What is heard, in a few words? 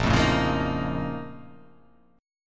A sharp Minor Major 11th